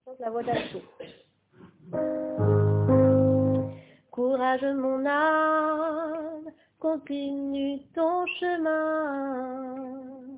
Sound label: Alto